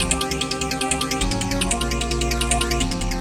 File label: Seq Sprinkla, Drum N Bass, Keys Pads; Sonic Foundry (Sony Creative Software); Knutrix Drum & Bass